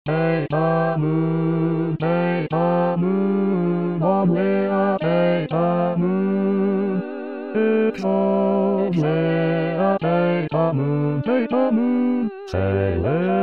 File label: Bass